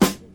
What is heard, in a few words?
noisy snare sound g key, steel snare drum sample tuned to the G note; snare drum samples